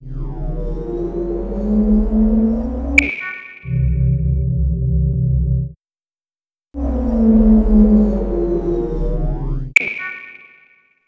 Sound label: Panel Medbay Scan; Among Us